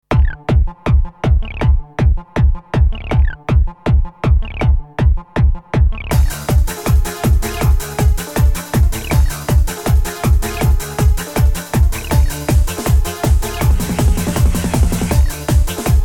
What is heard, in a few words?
rhythm